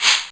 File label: DMX lo shaker; Greytsounds; DMX KITS